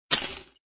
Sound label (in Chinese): qian; zhifubao